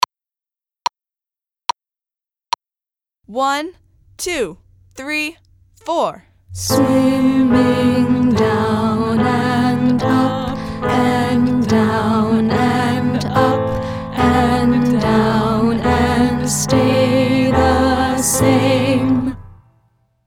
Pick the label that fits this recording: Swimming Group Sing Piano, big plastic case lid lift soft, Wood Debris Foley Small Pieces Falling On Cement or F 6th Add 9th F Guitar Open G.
Swimming Group Sing Piano